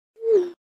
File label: text message received